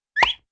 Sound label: fweep